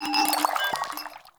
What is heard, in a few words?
Vial Foley B; Warframe; Lasrian; Lasrian Soldiers; Footsteps; Lasrian Goonadier